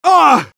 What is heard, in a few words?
pain sharp; medic; Garry's Mod; tf2 content